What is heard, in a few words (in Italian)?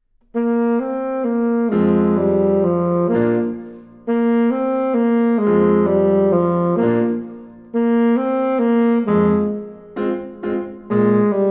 Saxo Tempo